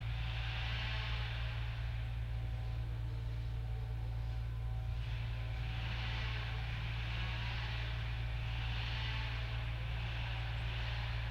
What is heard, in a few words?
yamanakako sound